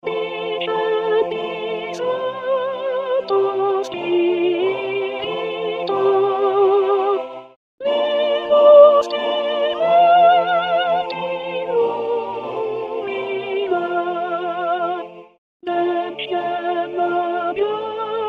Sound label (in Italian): soprani; discendi santo spirito